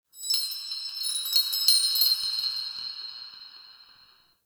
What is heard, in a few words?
Metal Chimes; Warframe; Ambience; Nokko Colony